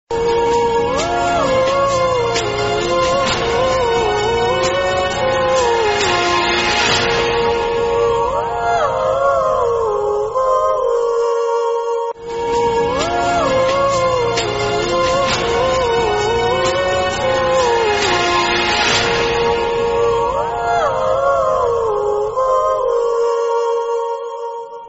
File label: Ringtone; Radio; Bhoot FM